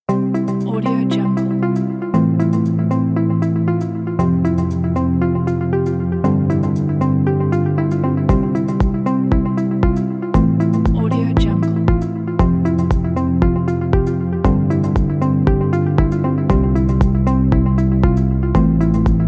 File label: bright motivation